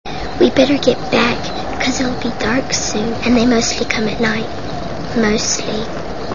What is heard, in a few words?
night; alien